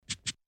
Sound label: Head Scratch